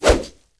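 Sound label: arch mage book attack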